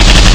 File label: On Attack